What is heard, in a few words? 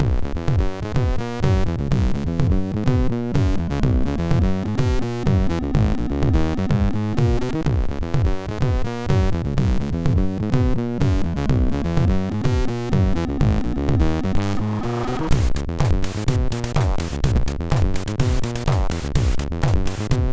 Dirty Loop sid MOS6581; hvsc